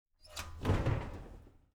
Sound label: Household Oven Door Open